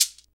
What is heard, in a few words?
PRC PDC SH, Shakers; Roland - Rhythm Section; Latin 2